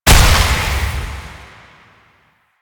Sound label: Light Orb Break; Warframe; Eximus; JadeLight